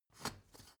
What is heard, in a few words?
step ladder wood scrape single